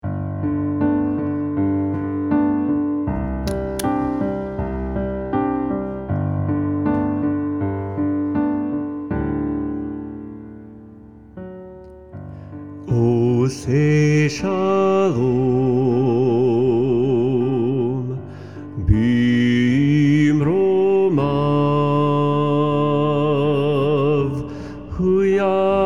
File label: MELODY; Ose Shalom